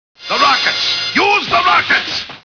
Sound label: rocket